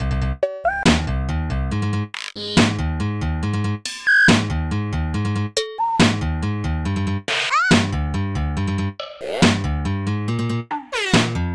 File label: bass jam